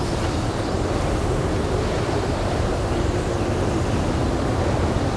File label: sandstorm; environment; Tribes2